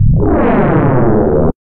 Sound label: Faulty Hyperdrive; Instruments; Effects; Ableton; Synth Essentials; Operator